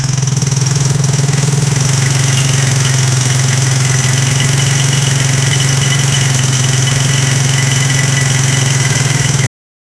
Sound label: engine motorcycle 1000cc